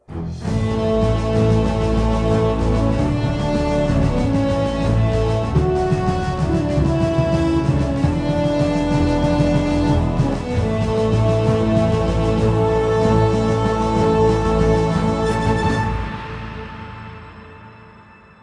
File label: Victory; pearl harbor defend the fleet windows gamerip 2001